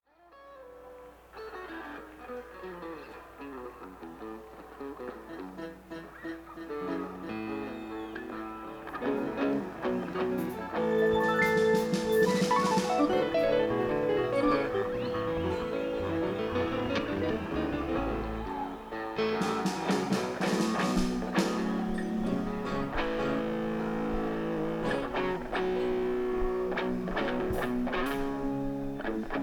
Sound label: Tuning